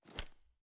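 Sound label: pistol 1911 ads down; gmod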